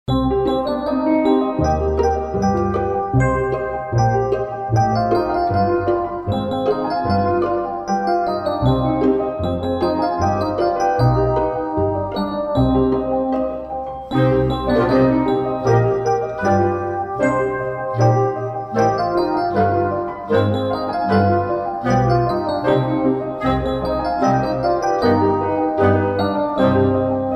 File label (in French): Entre le boeuf et l'âne gris instrumental